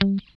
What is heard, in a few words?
GUI G3, E-GUI MUTED; Sound & Vision; Gigapack I CD 2